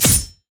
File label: riposte block; LoL; fiora